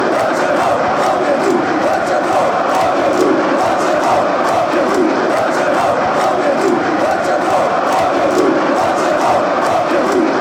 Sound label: croatia chant